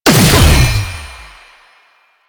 Dash Cast; Warframe; Lasrian; Lasrian Soldiers; Abilities; Butcher

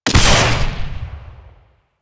Projectile Explosion; Enemies; Warframe; Vomvalyst; Teralyst; DE

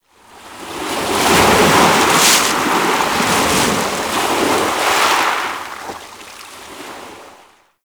Ocean Wave Crash; Warframe; Grineer Ocean

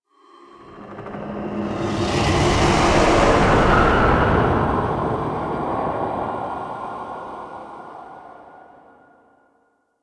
Spectral Whoosh; Ambience

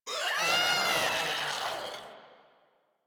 Infested Runner Explosion Warning; Warframe; Runners

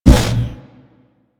Colossus Swing A; Warframe; Colossus Boss

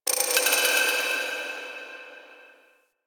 Moon Clockwork Texture Small, Ambience; Warframe; Orokin

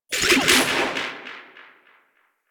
Syndicate Power Buff Dissipate; Warframe; Syndicates; Generic Powers

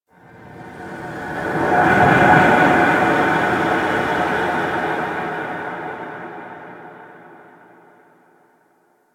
Random, Ambience Interior; Warframe; Orokin

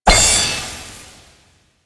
Glyph Ball Slot; Warframe; Duviri; Glyph Puzzle

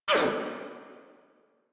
Pain Grineer Female, Barks, Battle; Warframe

